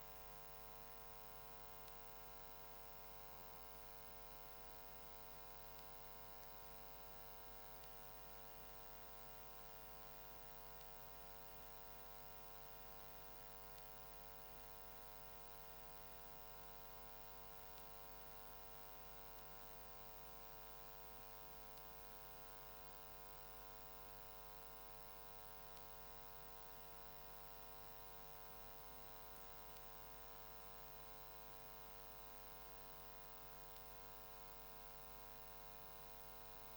SAQ Testschleife RF

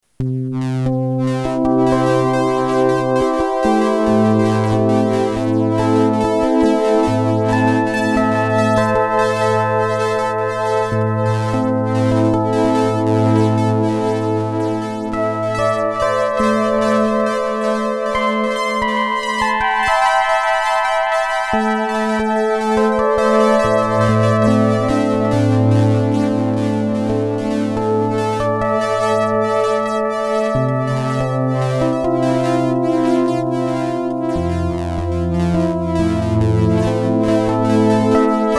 Synth

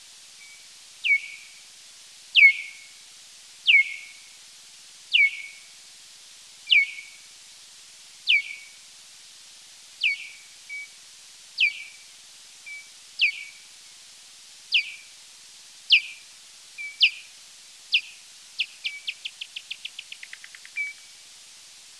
chirps

